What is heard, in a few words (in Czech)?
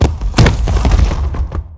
dopad; Mafie 1